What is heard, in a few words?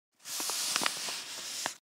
envelope; باز کردن